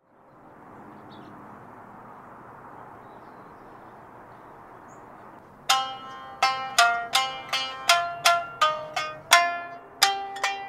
Shamisen; ساز ژاپنی

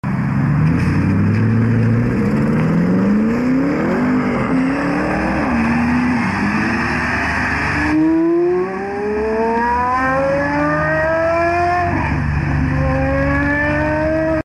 Gordon Murray, اگزوز

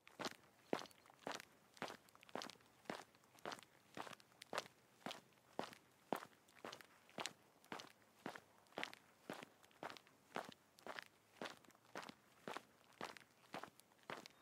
asphalt

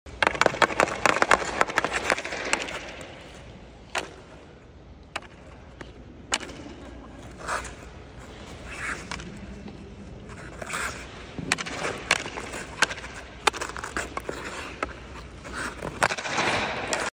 ice hockey; هاکی روی یخ

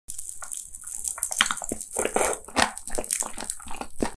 eating jelly

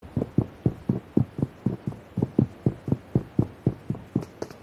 Foot in pubg; پابجی

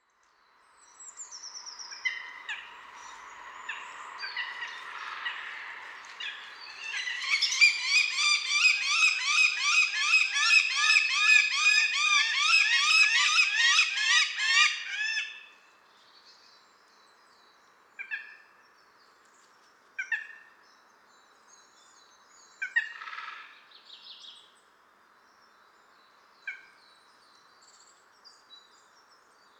Eurasian goshawk

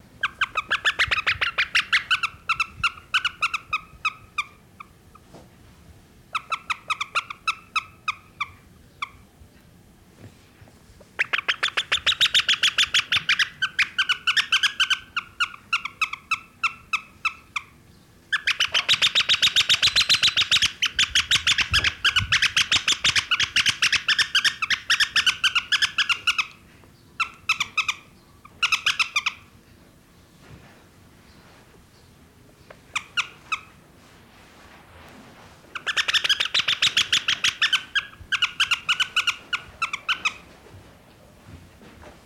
Fairy prion

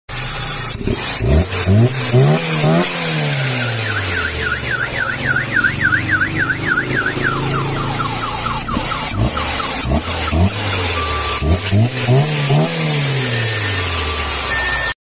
Ram air; کیت مکش

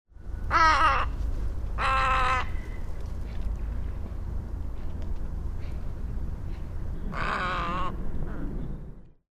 Little egret

